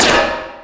battery assault launch; weapons; dota; rattletrap

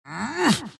grunts; dota; skeleton king

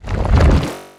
magnetize debris; dota; earth spirit

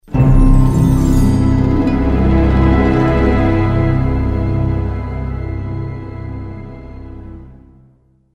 music stinger; dota2

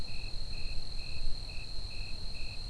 radiant night base loop, ambient soundscapes; dota

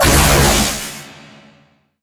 lightning strike; weapons; dota; razor

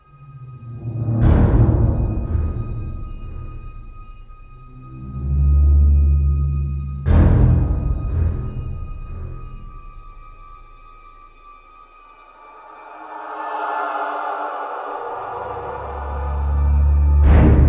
music; valve dota 001; diretide; ui startup

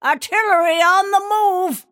move pain; snapfire

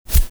psi blade; templar assassin

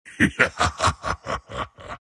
troll laugh; dota; troll warlord

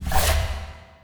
find match slide in; ui

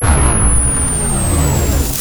sunstrike charge; weapons; dota; invoker

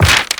page turn, book; ui

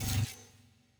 teleport ray bad area; ui; dota; vr

